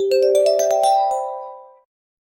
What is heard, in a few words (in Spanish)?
tintineo de charms